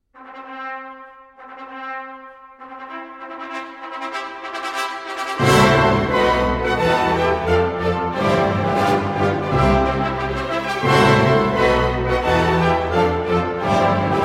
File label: music